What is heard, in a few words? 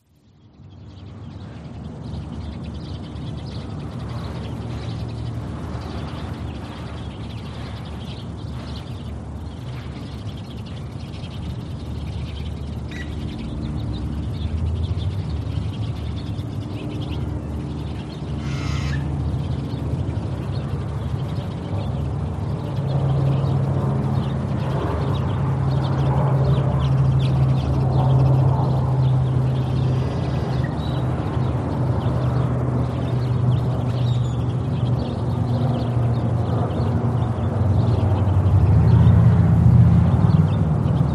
audio birds traffic